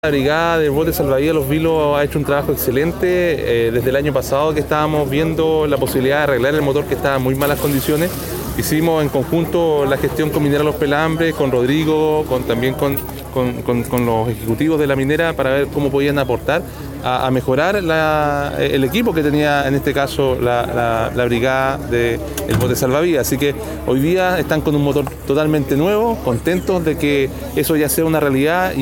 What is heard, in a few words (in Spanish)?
Motor Bote Salvavidas; Gobernador de Choapa